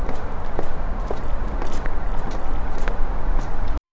distant city ambience and

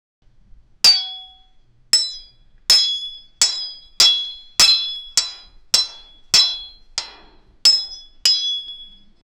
sword clang fire burning; weapon

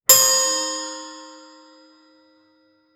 a single high pitched chime

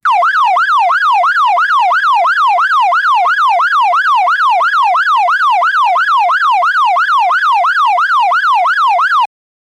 emergency

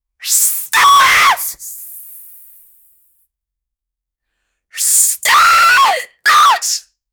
vampire blood perfume spr

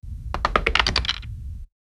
chess piece move; chesscom

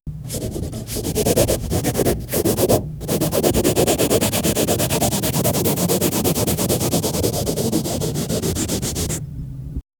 gentle ear cleaning sound

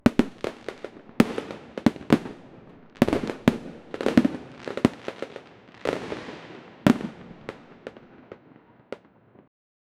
havai fiekler, fireworks

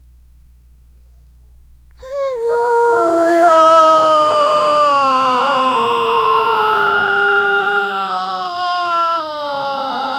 wounded male crawling ago; horror game

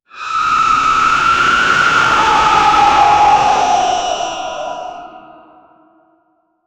mercenary transforming in, transformation

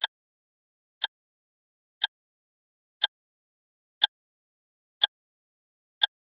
clock ticking fades into

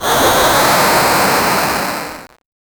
fruit falling short sfx, 8bit

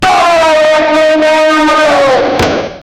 powerful roman, battlecry

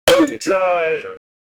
the man chopping the lett

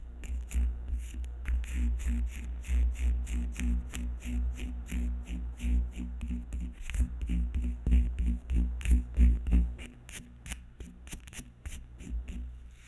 realistic binaural asmr o, massage

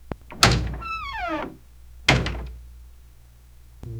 old heavy wooden door ope; doors

